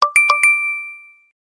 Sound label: cute cool beep beep sms tone